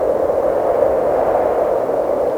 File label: Firefly Zoom; Restaurant; Act Stage 6